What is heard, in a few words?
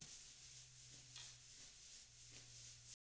TAMA drums; ILIO; Double Platinum Drums 1